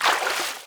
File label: water jump; firefight